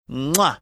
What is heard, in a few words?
making chefs kiss sound